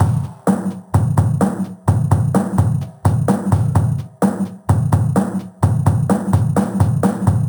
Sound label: Loops 128BPM, Drumloops